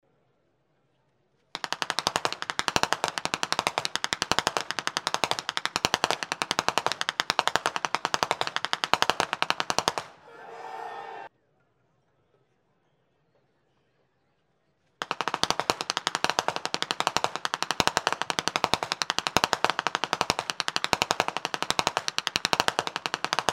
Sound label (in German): schnalzen; rupertigau